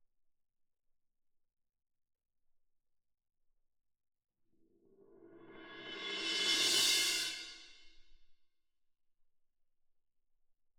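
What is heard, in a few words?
Cymbal Main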